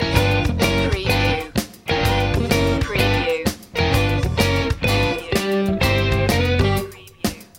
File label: loop